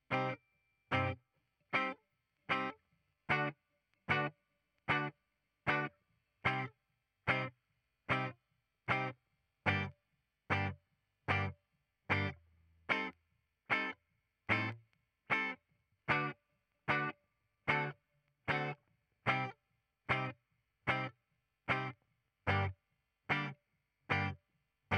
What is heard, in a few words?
Gtr, Ragtime